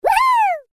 voice laugh; Fruity Burst 2

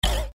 cascade Land; Bouncy Balls 2

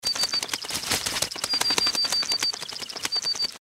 birds sparrow; Bouncy Balls 2

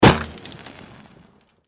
torch Light; tiki-beats; eyecon

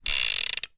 claw; Fluffy Favourites Remastered; prize-pick

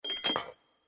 spin miss; Eternal Wishes LuckyTap

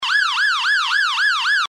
siren loop; Beat the Bobbies 2

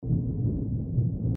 reel spin; Highland Reels

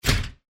spin click; Hot Off The Press

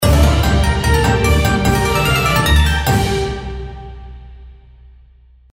you won; Crystal Furnace

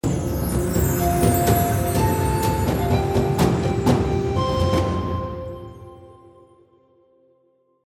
big win; Shamans Dream 2

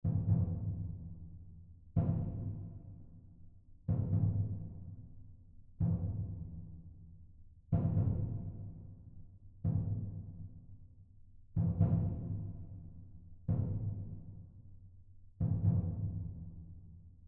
tension Loop; Dynamite Digger Eureka

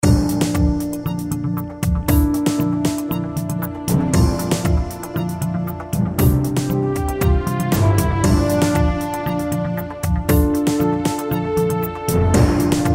bonus game music; Hot Off The Press

